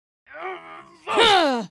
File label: War Face Stomp Fail; Goddess of Trampling